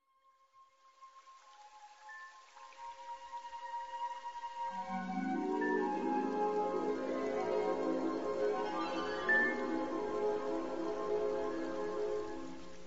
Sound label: Cave Music